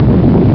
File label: THRUST